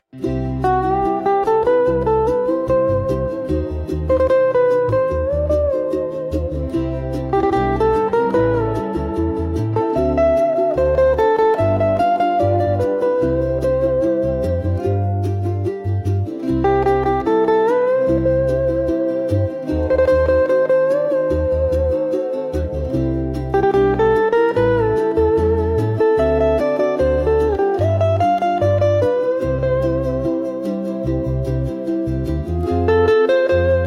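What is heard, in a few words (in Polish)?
Muzyka Hawajska; Mele Hawaii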